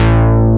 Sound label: BASS, SYN BS